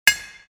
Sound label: hammering